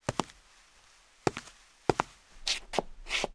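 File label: footsteps; tomkroky; Mafie 1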